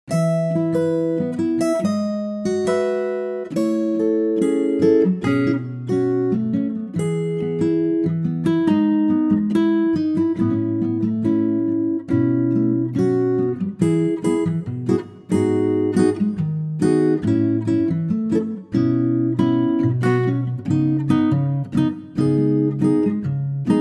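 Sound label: Ac Guitar E, male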